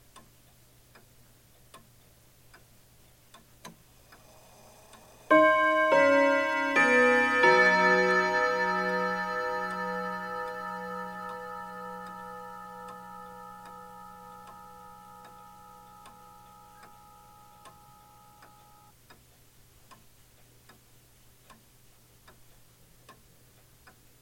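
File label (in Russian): звонок колокола арпеджио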